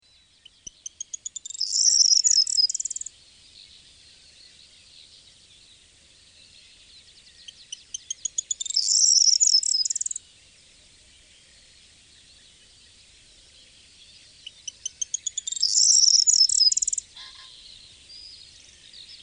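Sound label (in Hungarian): Sordély; Madarak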